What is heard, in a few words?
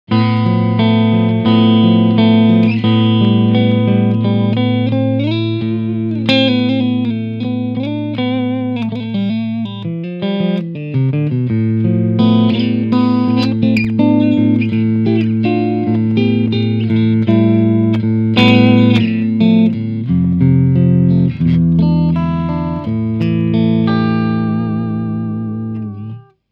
Clean Channel